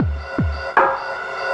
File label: Beats, Synchro Funk; Junk; Sonic Foundry (Sony Creative Software)